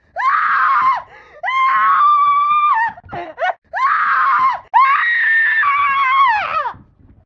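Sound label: Screaming Loop; silent hill homecoming; ps3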